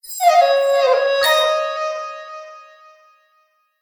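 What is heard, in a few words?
music stinger lootbox lunar epic; heroes of the storm full gamerip 2015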